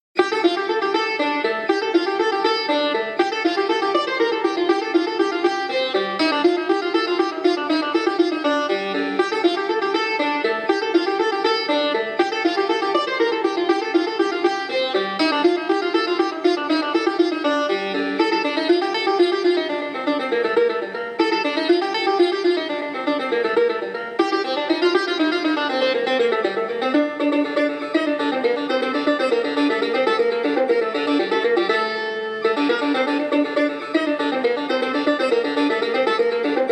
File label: study of dashti tar; first book